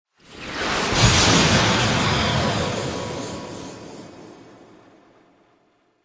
Magical Blast Thick, Magic sound of casting spell, conjuring or wizardry